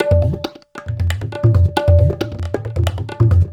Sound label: TABLAS; Zero-G; Chemical Beats